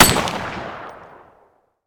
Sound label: Weapon fire single rifle; gamerip